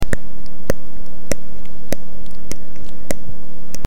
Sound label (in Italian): pesce, bisogna aprire e chiudere la bocca